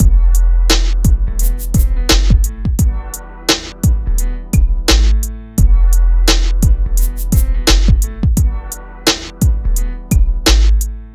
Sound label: MIX, Hip Hop and R&B Drumloops